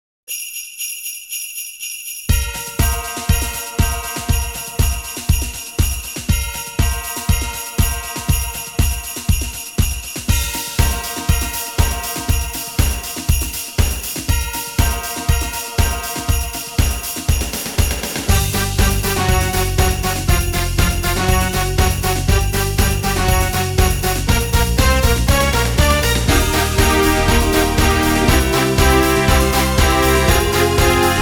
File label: Jingle Bell; Ojamajo Doremi Mo~tto!; Mo~tto! Ojamajo Doremi! MEMORIAL CD BOX